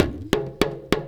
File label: BIG TAMA HIT; Best Service; World Colours